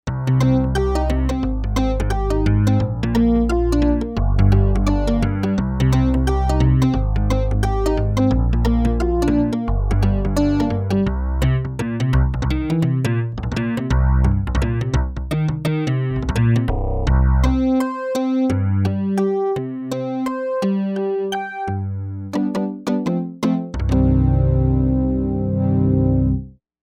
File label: Percussive